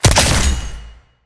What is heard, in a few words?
kill, gun